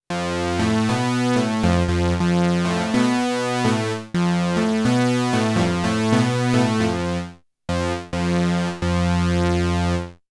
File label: OBXa Dual